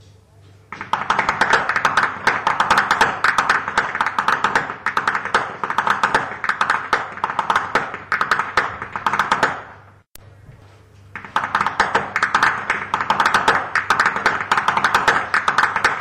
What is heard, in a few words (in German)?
schnalzen; surheim; saaldorf